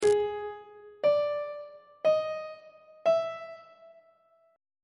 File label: g sharp 5th intervals on treble clef